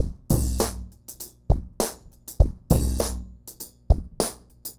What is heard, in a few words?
Remixed Drums 100BPM; The Roots Drumloops